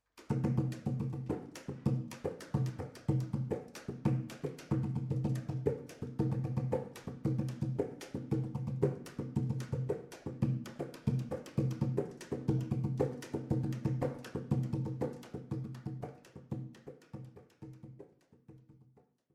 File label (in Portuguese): Rebolo